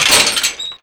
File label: gate iron open; Doors; ModernWarfare